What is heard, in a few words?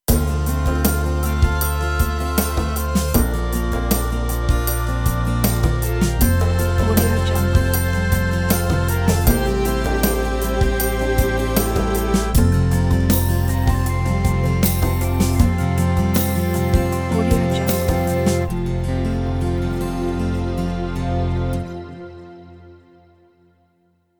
reflective ident; simonleng